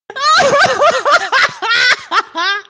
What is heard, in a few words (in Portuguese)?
rindo